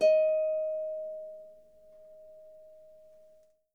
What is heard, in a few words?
Harp D#5; Miroslav Vitous - Percussion & Harp